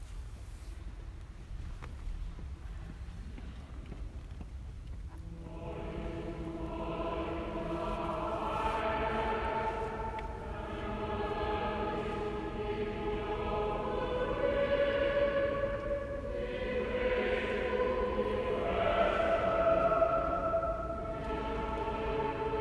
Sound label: cathedral choir gloria